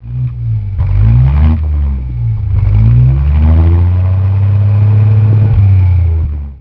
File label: bentley 35